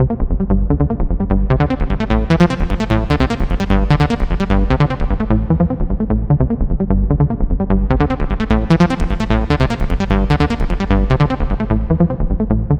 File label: Blue Bass, acid; 100 acid vol 3